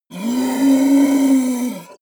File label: yeti groan painful; kreaturen